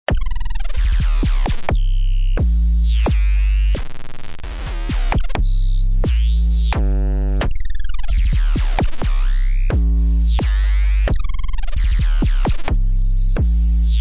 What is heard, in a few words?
131 distorto crazybass, bass loops